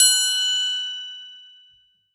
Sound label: Lightbulb, Classic SFX, cartoon; musicradar; cartoon caper